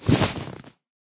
leather, horse; minecraft

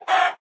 hurt, chicken; minecraft

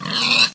death, pig; minecraft; mob

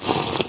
drink; minecraft; eaglercraft

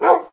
bark, wolf; minecraft; mob

